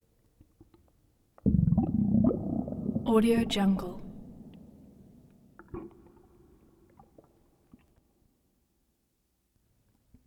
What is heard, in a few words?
body plumbing interior body; prosoundfx